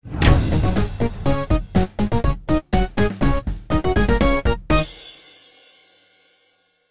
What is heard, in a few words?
win; RS Games Sounds; Exploding Kittens